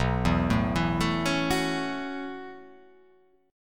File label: B 9th Suspended 4th B down